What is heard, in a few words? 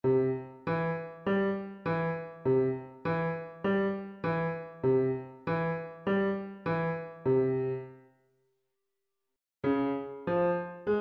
piano staccato triads low range 50 bpm